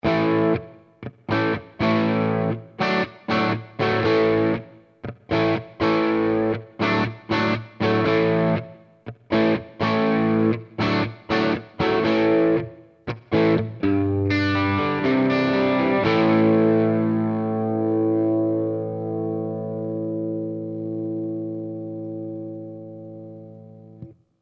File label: Rock single bridge